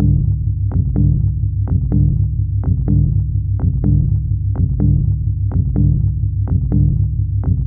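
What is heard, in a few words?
bass 125 bpm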